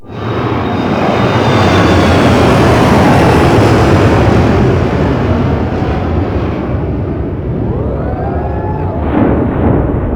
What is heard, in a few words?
Cargo Ship Flyby; Warframe; Ambience; Corpus; Crp Exterior